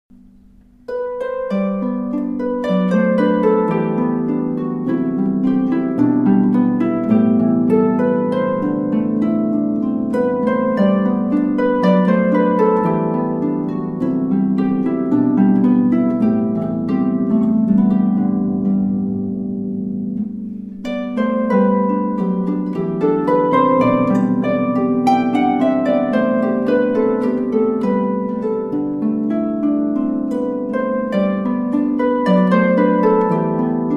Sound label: Harpist Irish Air; Houston